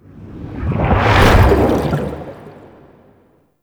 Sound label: Anchor Transition Out; Orbital Station; Warframe; Tau